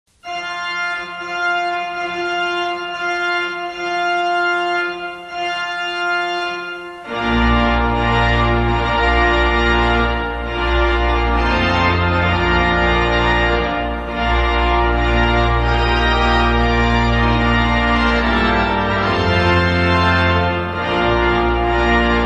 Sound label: Wagner's Bridal Chorus (Pipe Organ Solo); Mariage